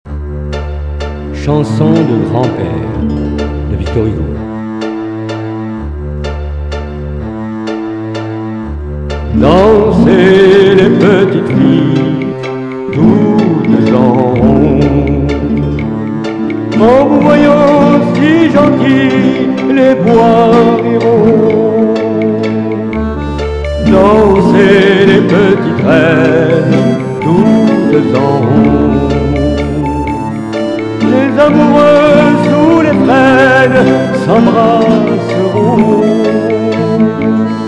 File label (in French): chanson de grand pere